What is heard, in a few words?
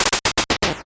8bit se